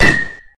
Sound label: hit